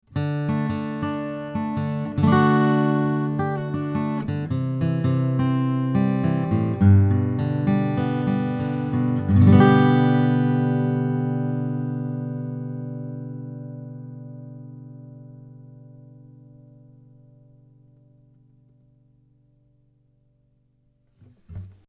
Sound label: Steinberg AXR4 Ac Git Pick 20cm 15th Fret